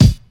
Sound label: airy bass drum sound b key, Royality free kick drum single shot tuned to the B note